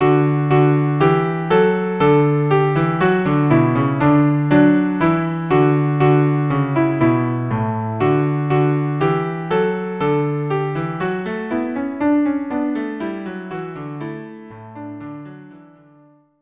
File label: Ode to Joy viola duo or viola cello duo